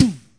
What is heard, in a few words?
SNARE, BeatBox Female; drums full kits; unusual kits and fx